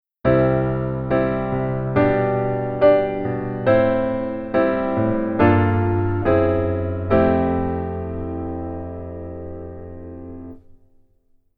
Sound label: Keyboard